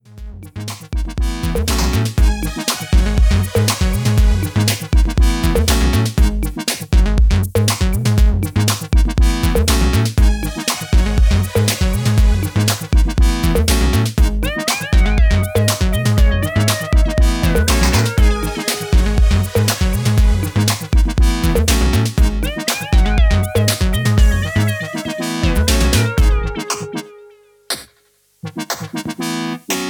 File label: phase lock bass